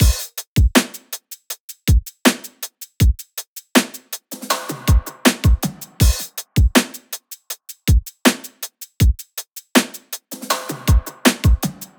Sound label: drum loop 80, Pop; Drums; Full Drum Loops; Slate; SD PASADENA Pop Sample Pack